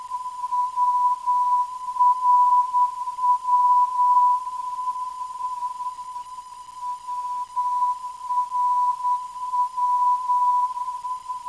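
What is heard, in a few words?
WRW 525kHz, non-directional beacon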